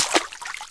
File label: foot, ambient; Shadowman